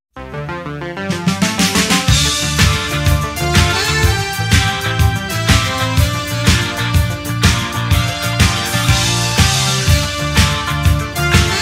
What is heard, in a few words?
frog music; Frog Crossing